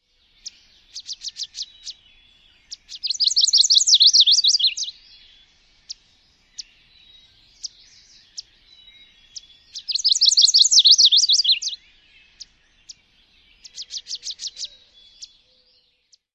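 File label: Chestnut-sided Warbler dawn song with lots of calls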